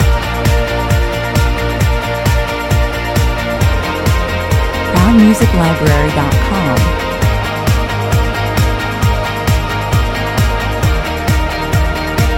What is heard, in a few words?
fast car loop